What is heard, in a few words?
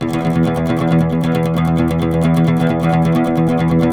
OUD E; Guitars; Spectrasonics; Hans Zimmer Guitars VOL-2